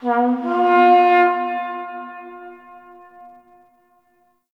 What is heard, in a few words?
Battle Trumpet Horn Call; Warframe; Tau